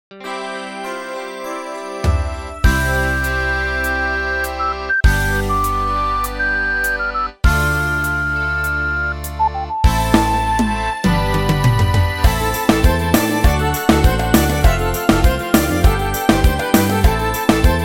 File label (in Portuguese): Forro Maior